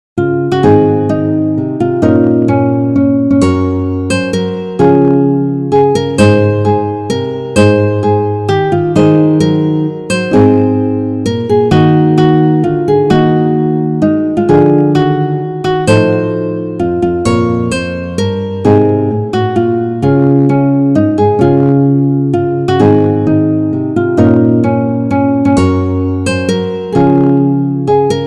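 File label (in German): Gitarre; Ach du klarblauer Himmel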